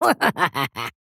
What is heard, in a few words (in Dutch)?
cartoon lachje